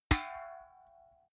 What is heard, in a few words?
big can hit hard